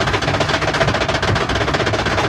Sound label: climb loop; loud; Left 4 Dead 2; Source Engine